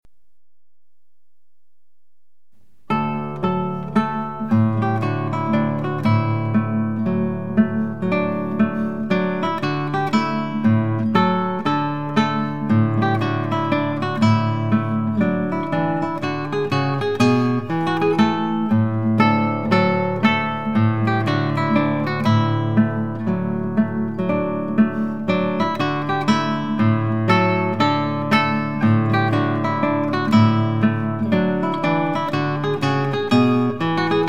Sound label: Trumpet Tune